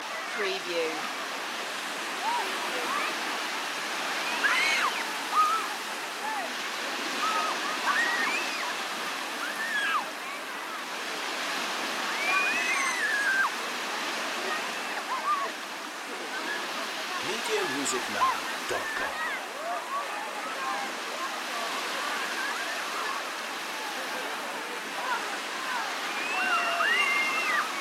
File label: kids on the beach; the sound pack tree